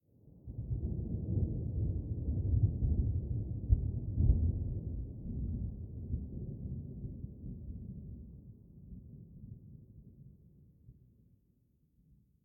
Thunder; Prison Architect

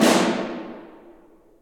Snare

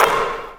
net swish; sports room sfx; birthday party bash; wii

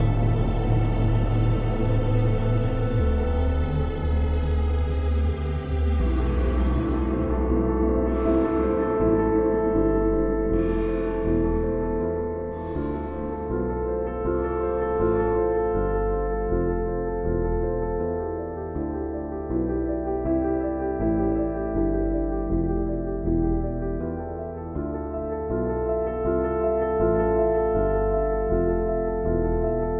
Steelworks Slow; rive wreck hack die retry; ps4

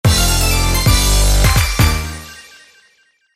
Jingle Mission Start; Gun Gun Pixies; PS Vita

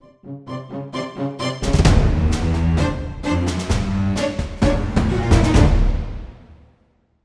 jingle kraken; Seven Seas Solitaire

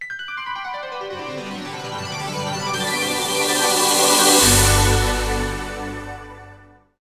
TECNO; Reverthion; PS1

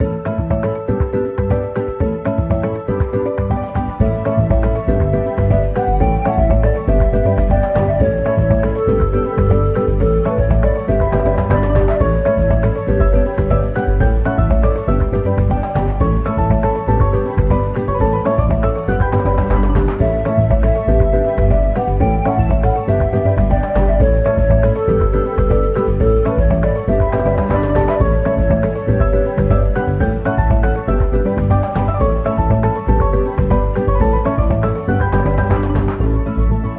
Muffled; super mario and the rainbow stars; Crash Landing

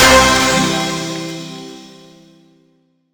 Finish Level; Futuregrind

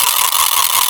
ratchet; Birthday Party Bash; Wii

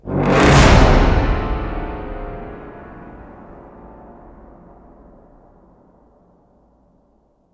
RN Stinger

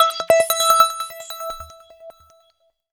Quest clear; MegaTagmension Blanc + Neptune vs Zombies; PS Vita

